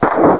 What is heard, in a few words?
Fire Mine; Covert Action; Strategy